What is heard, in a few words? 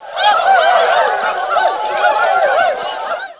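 sword fight; Civilization 2 Multiplayer Gold Edition; NEWWORLD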